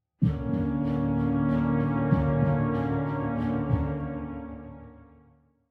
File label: 190bpm; Legends of Pegasus; windows gamerip 2012